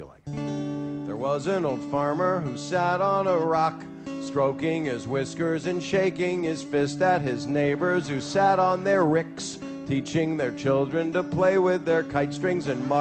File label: Old Folk Song